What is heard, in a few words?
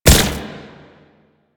Concrete Archgun Projectile Explode; Warframe; Archwing